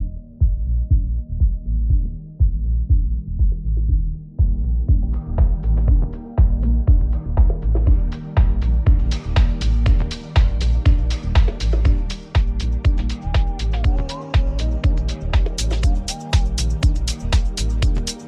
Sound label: huawei y9 ringtone